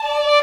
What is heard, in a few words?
violin